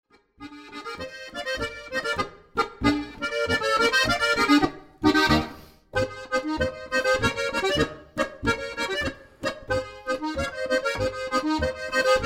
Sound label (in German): boarischer